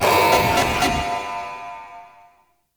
Swarm UI Scanner Jammed; Entrati

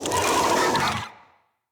Infested Big Ashen Vocal Attack

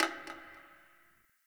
Garbage Metal Can

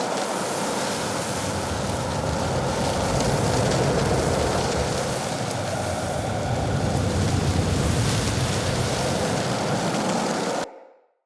Hallucination Smog Ring Loop; Bromius; Abilities

